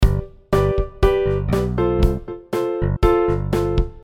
Beat

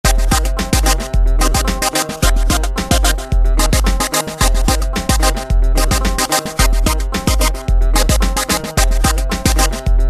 Synth

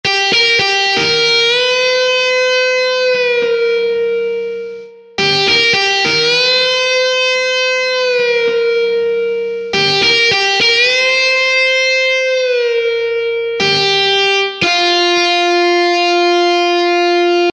Guit

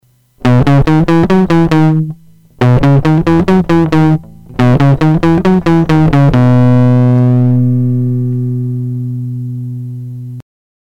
guitar

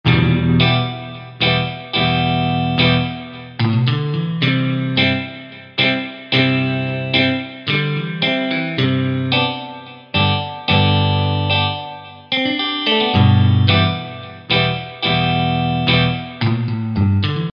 Beat

